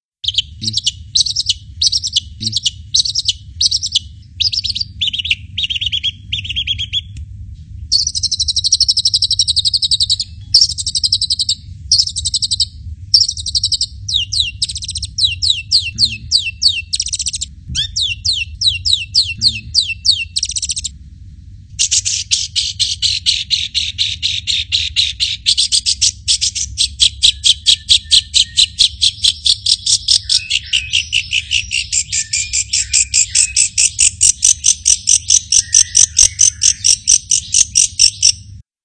cendet; teroris

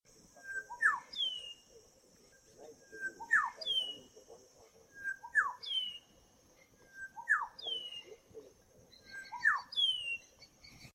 suara panggilan anis bentet kecil

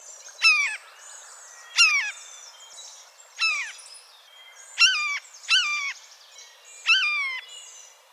bluejay meniru suara elang

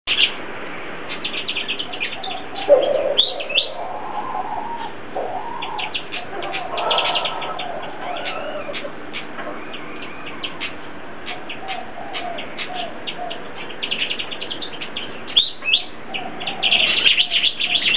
suara burung kipasan belang

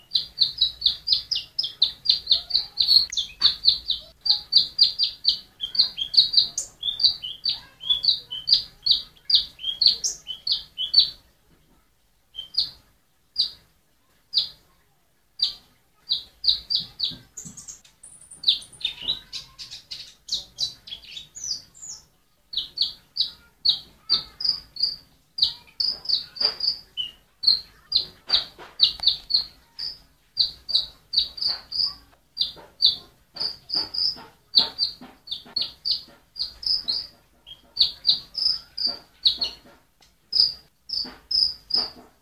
kolibri ninja

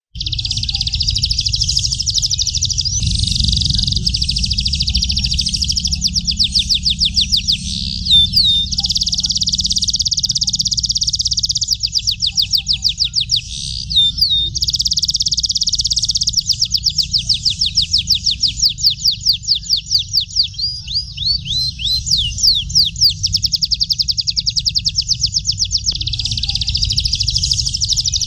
audio kenari; om akuang

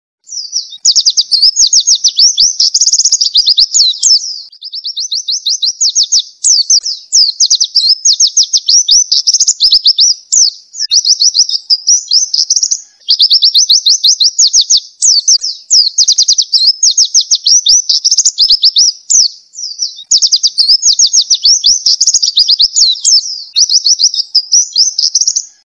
suara masteran spesial pleci

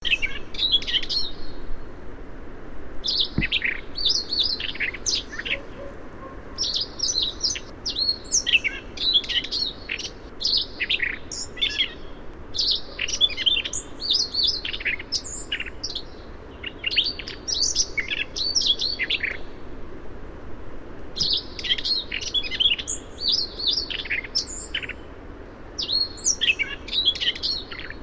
suara siberian rubythroat

